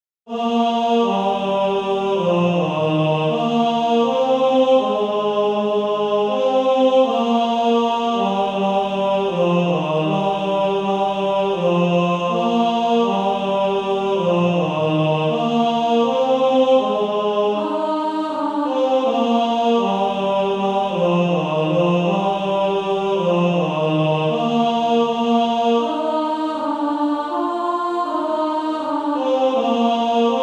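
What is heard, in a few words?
Male Choir, tenor 1; He Leadeth Me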